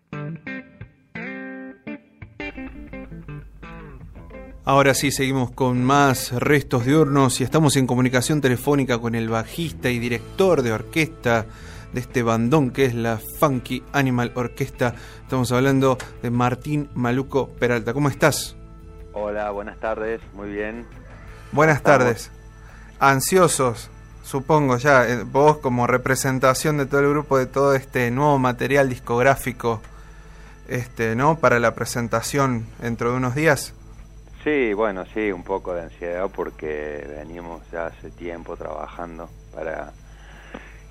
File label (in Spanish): funky animal orquesta